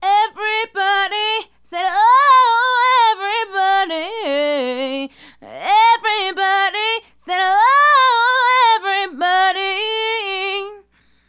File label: Female Solo, Hip-Hop and RnB Vocals